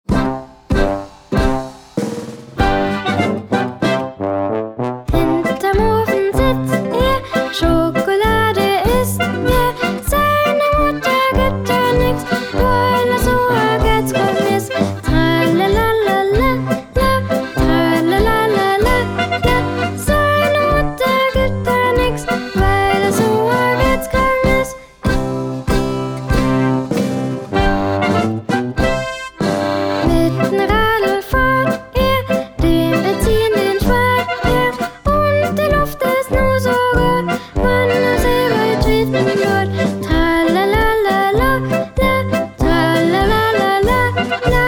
Kreuzpolka traditionell

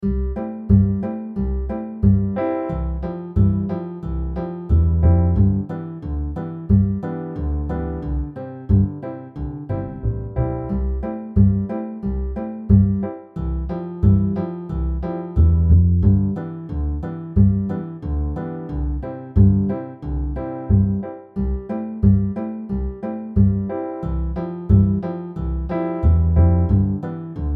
Playbackversion

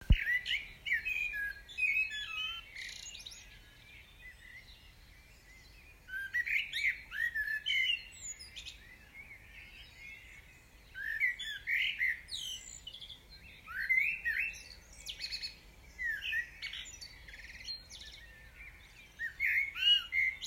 Morgenkonzert Singvoegel

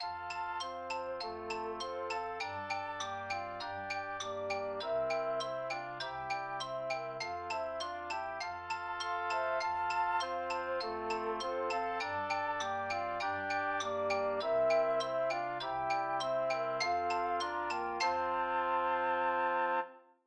Stabspiele Floeten

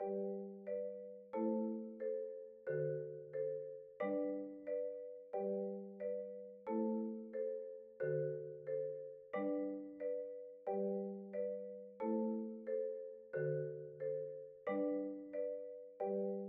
Begl Stabspiele

